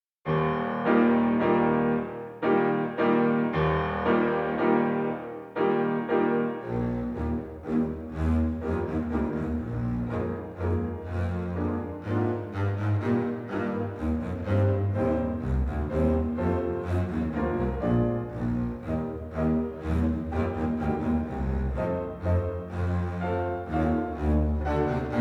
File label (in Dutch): Contrabas; Instrumenten orkest